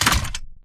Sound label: Damaged Torso scrape Concrete